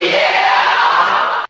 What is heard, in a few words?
"yeah" crowd; ooh ahh